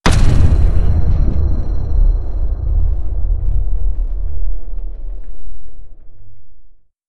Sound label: impact